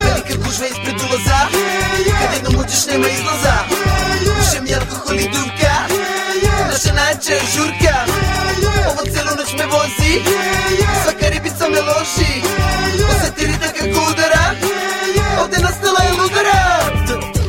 srpski hip hop

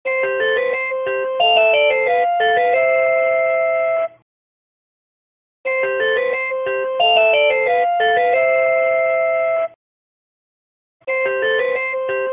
easy telephone sound; ringtones

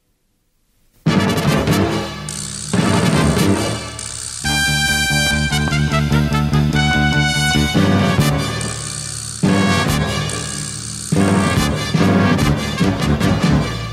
BGM; VOLTES V

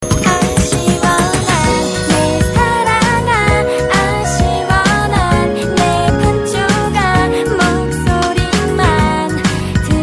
sugar bubble

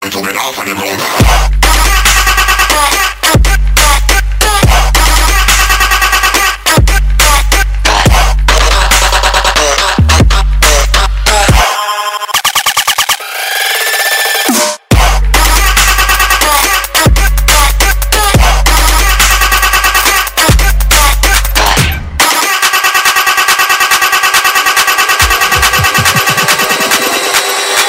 Trap Jungle

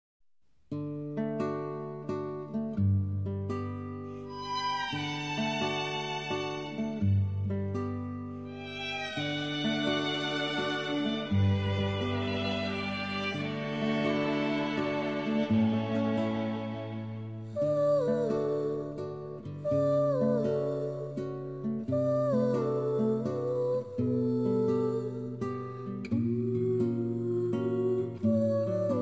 ocarina song; epona song

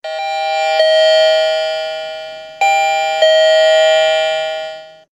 Bell Notices

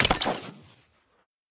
Headshot, ringtones; Halo 5

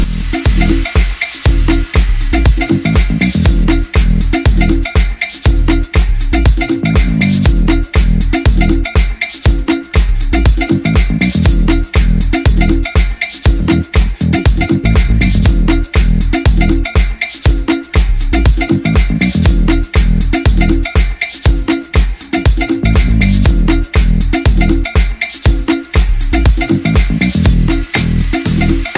Disco

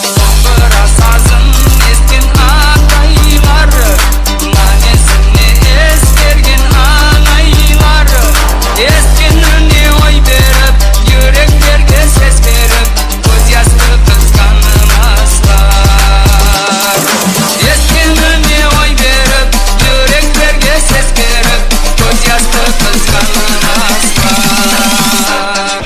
Dombra Remix

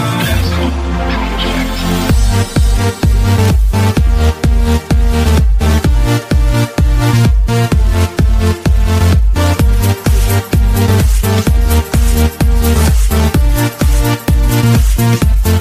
Electro Dance Mix

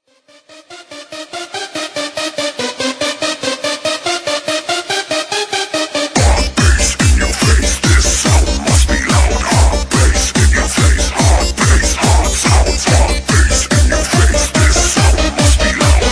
hard bass hard soundz

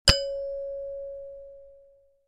nokia pureview; ringtones

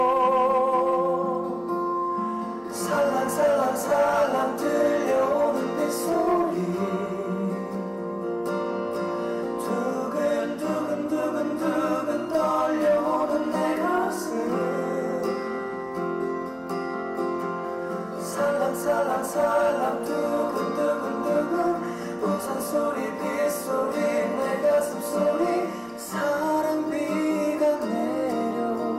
rain love song; rnb

